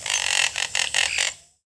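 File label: tropical bird; Counter Strike Model Pack; CS:GO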